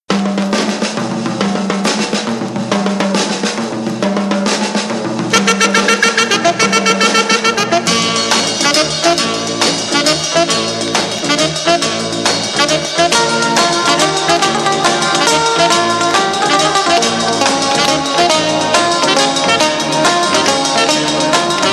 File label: WALTZ